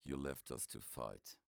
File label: m 130 mel d-flat, Vocals